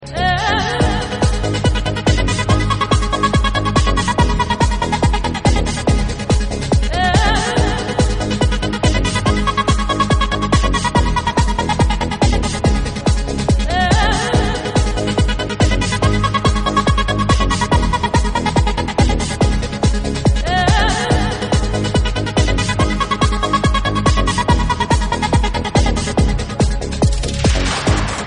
atmospheric mix

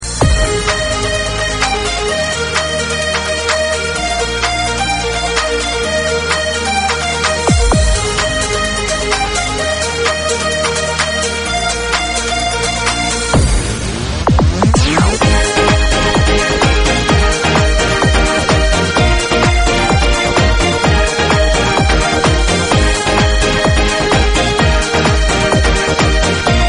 Flute Version

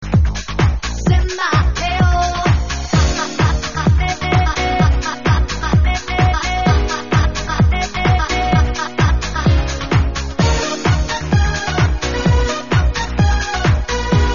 Dancehall Style Short

